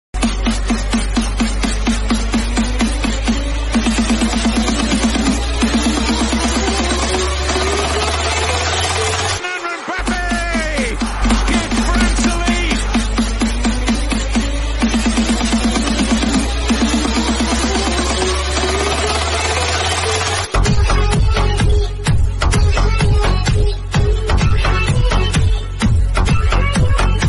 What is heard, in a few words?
instrumental music